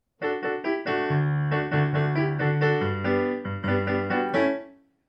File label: Caribbean style voicing